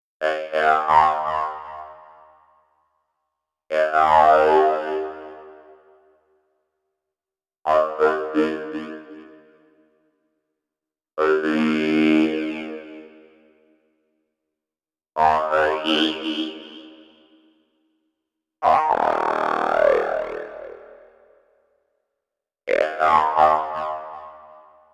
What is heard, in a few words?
Electro Vox, EDM; Sonic Foundry (Sony Creative Software); Afterhours EDM; Retro Fit